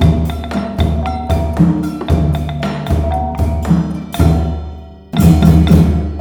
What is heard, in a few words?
MALAY, Heart of Asia; Spectrasonics - Heart of Asia CD2